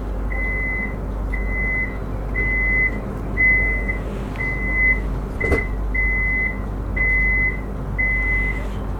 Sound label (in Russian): dveri